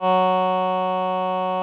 VP-330, String Machines

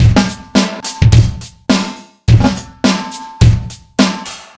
Funky 105 gated, Drums Beats

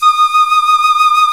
piccolo

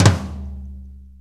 6-8 Tom Flam; Drum Tools; Sonic Foundry (Sony Creative Software); Loops & Samples Drum Tools; Flams

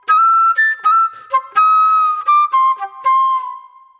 Soul Plate Flute

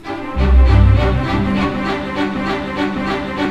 BIKE; East West; Scoring Tools; ORC; Bike Ride C

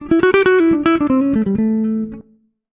SOLO

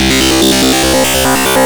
GRUNGE

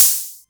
HatO, Roland MC09; Drums

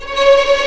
C#5 TREM, VLNS TREMOLO, String; East West; The Ultimate String Collection

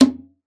Conga; ProSamples vol.42 - Session Instruments

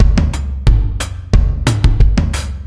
Beat, Drums, Eclectic Electronica

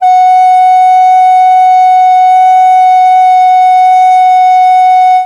FLUTE NOTES, Primitive Instruments; Didgeridoo and Other Primitive Instruments

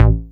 BAS A1, 2 Step; USB Soundscan vol.51; House Side Of 2 Step; AKAI

